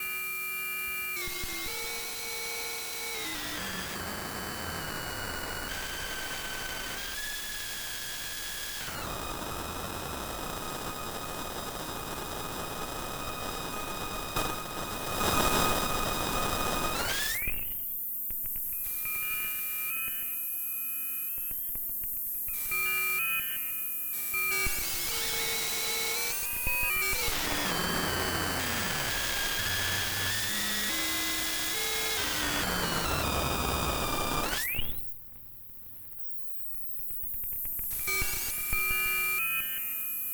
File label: Radical Delay Noise; Alexander Pedals